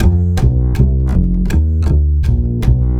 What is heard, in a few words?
Acoustic Bass